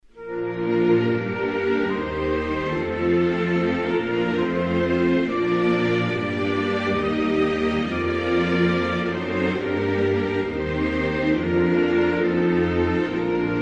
Grande Bretagne, Hymnes nationaux

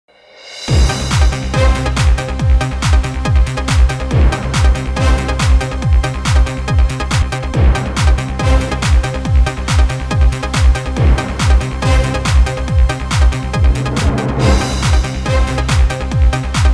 Emission Minmalist, jingle; Jingles medley

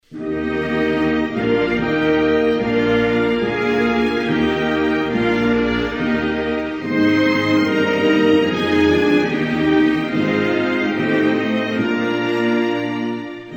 Allemagne, Hymnes nationaux

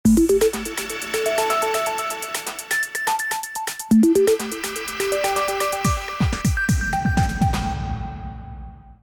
Flash Info link; Jingles medley

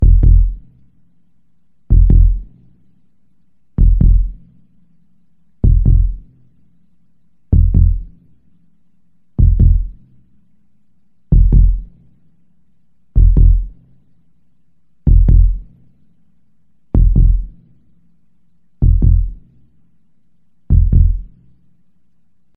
Battements de coeur

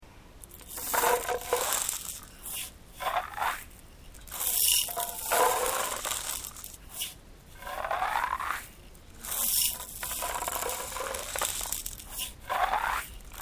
tambour maracas

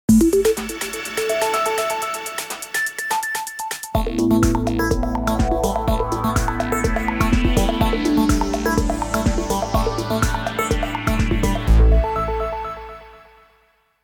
Flash Info jingle; Jingles medley

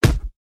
Coup de poing; Coups de poing

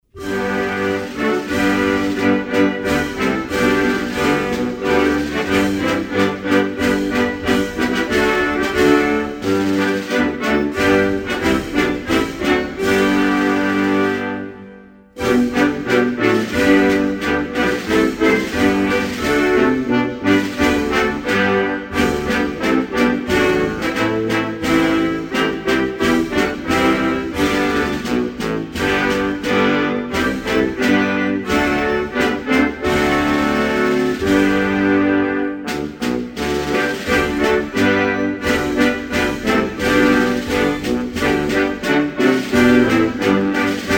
Rwanda, Hymnes nationaux

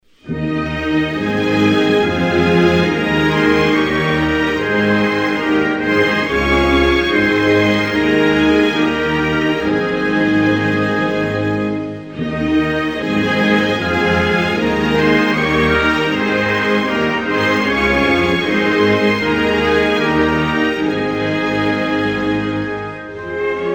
Pays Bas, Hymnes nationaux

